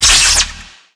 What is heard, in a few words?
static static link; Warcraft 3